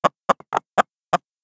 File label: chicken idle, creatures; Counter Strike Model Pack; CS:GO